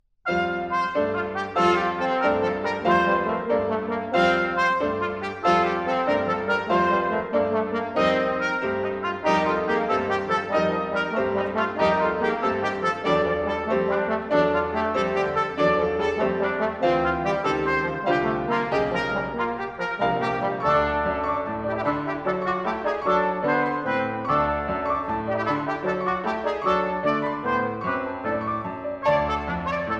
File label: Divertimento For Trumpet, Trombone, & Piano Allegro